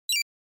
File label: NES RPG